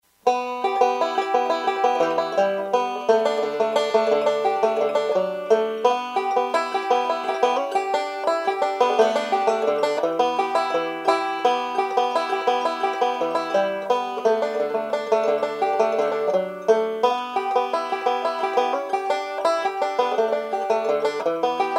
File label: Drunken Sailor, banjo; student